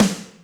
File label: Snr Combo Room, Alesis D4 drum machine